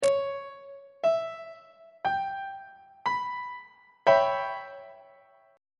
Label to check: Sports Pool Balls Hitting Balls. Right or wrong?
Wrong